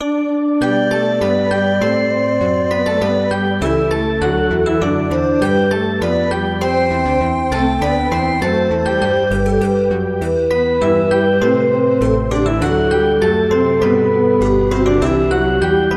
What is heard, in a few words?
Morning Music; Activate Bubble System; Gradius; Music Arrange Gradius